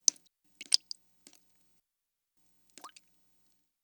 blood drop

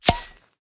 open beer

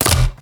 grenade

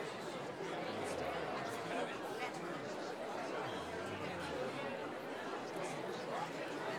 crowd talk fear

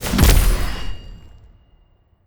cypunch

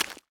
slime

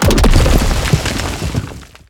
rock

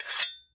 sharpen

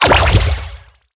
shotgunxdouble

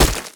ore throw

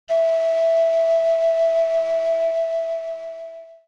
bone flute

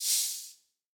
atk heal

